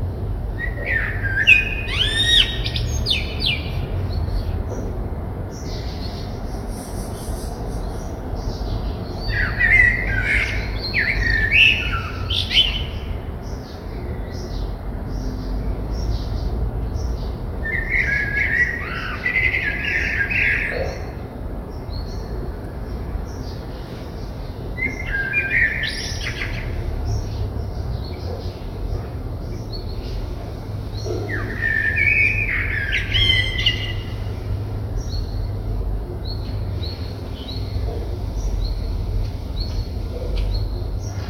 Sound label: sun ambiance